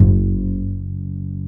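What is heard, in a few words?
double bass F#2, Jazzistic; Univers Sons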